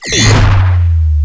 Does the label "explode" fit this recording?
Yes